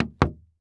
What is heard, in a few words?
Foley Footstep Hard Sole Dress Shoe Single Step Down On Solid Wood Platform; Foley Footsteps